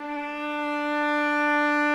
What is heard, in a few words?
trumpet violin